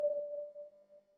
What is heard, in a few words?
radar ping